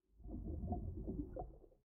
River; Blind Quest - The Frost Demon

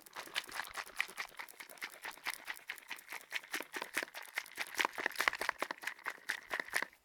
bottle shake, household; NS Studios - ultra sound collection

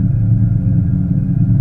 Machine Generator Loop; SFX Kit; Machinery - Generators

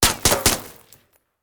Bullet Ricochet; Sound Ideas; Guns

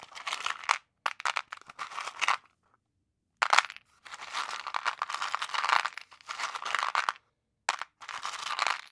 screwbox; best service Blue Box; mixed sounds long

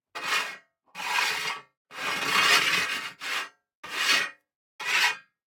DICE on Metal, Slide, Standard, One Die; Samples; ArticulatedSounds; DICE Sfx Party (Extended)

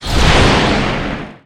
Rocket Launcher Shot; SFX Kit; Weapons

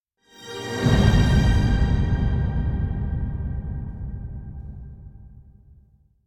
Symphonic Hit Deep And Dramatic Final Chord; Hollywood Edge; sound designer's toolkit v2

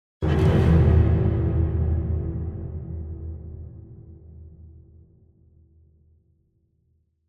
Symphonic Hit Bass Strings Scrape; Hollywood Edge; sound designer's toolkit v2

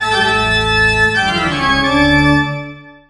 Music Tocatta Horror; SFX Kit; Foley - Misc

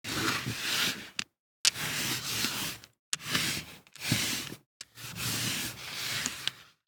DICE on Felt, Slide, Standard, 3 Three Dice; Samples; ArticulatedSounds; DICE Sfx Party (Extended)

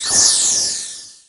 Pickup Magic; SFX Kit; Pickups

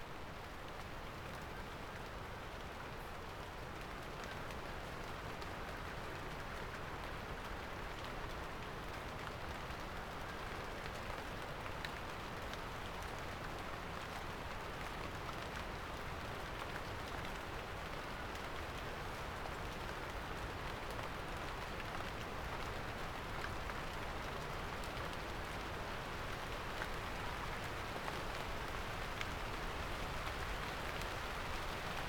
Rain onset palm umbrella; boom library; Thunder And Rain

